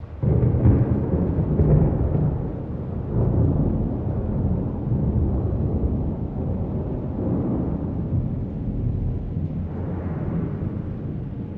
church lightning; DELTARUNE

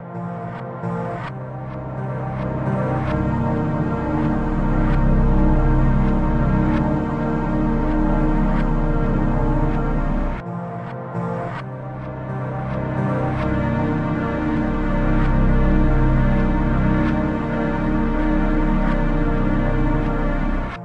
church lobby; DELTARUNE

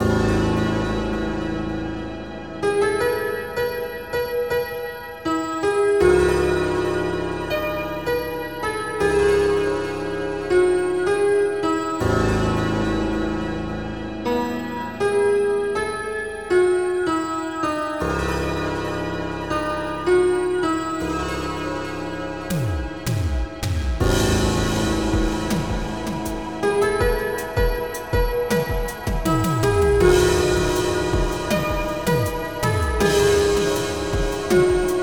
quiet church; DELTARUNE

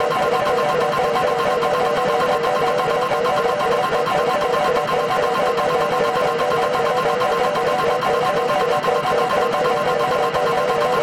sine drone danger high; DELTARUNE

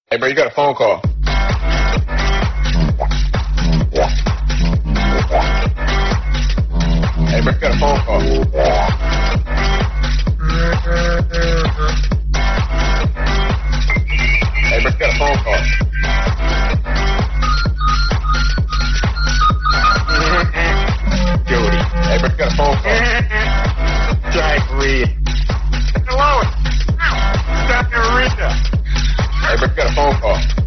ringtone

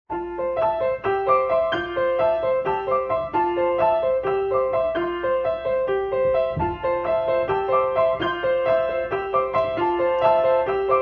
piano seven four; DELTARUNE

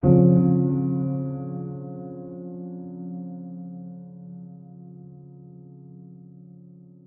sad chord; DELTARUNE

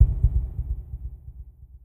heartbeat; DELTARUNE

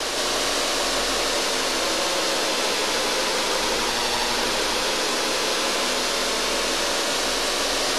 tv static bad; DELTARUNE

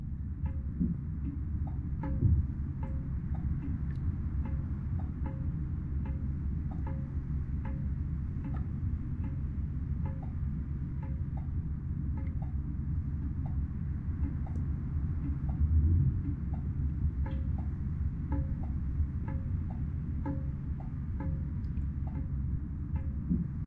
afterrain inside; DELTARUNE

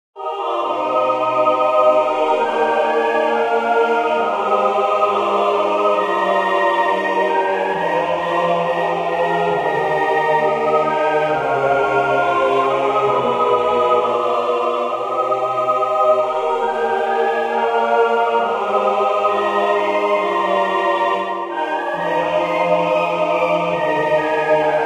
church hymn; DELTARUNE

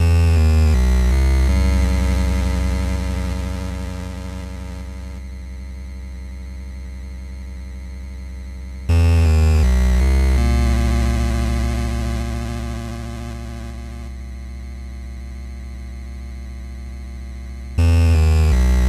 root 8bit; DELTARUNE

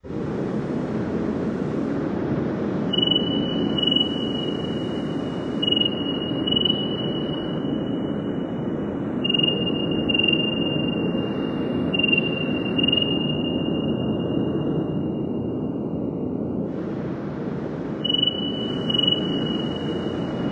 night ambience; DELTARUNE

